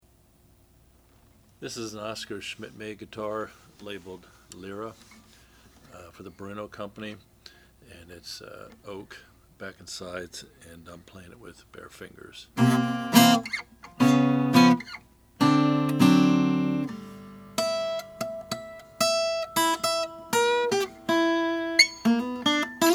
oscar schmidt lyra natural

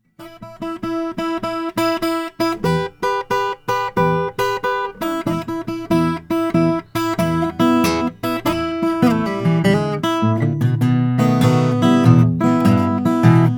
gerber sj cutaway african blackwood

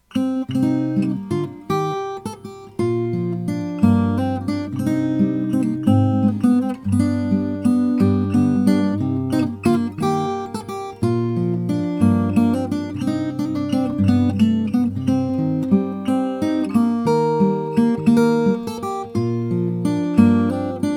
mayes l 32 brazilian rosewood

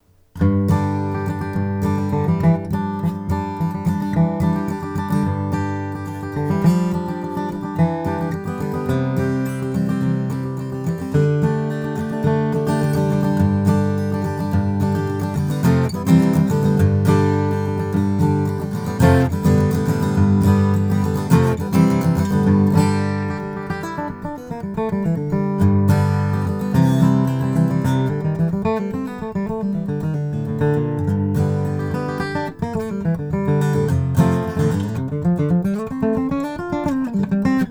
froggy bottom sj deluxe figured koa

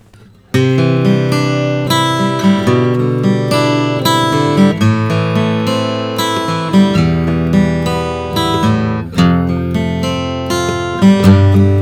collings om3 g short scale varnish

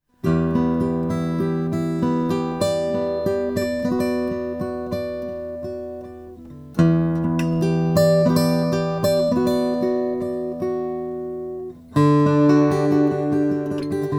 mcilroy a22 5 oriental walnut, guitar

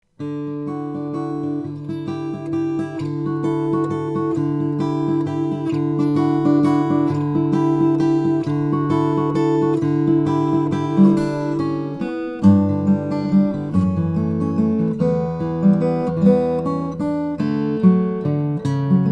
om mayan walnut sunburst, guitars; santa cruz

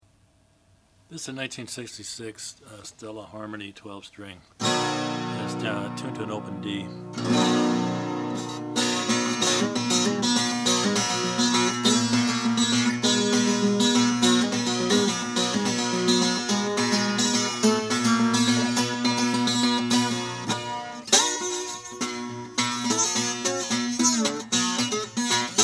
harmony stella h912 sunburst

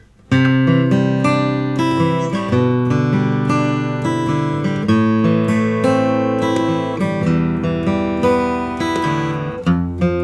martin custom 000 28 authentic natural

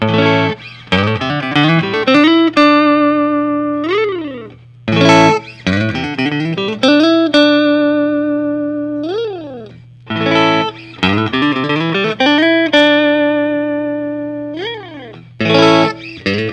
fender custom shop 60 stratocaster relic

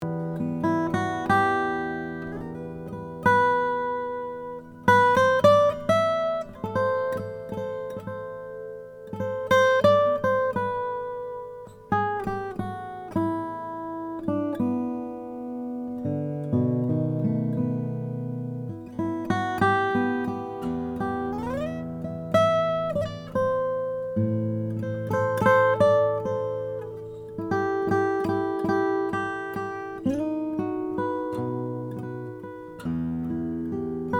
2012 longo 000 koa

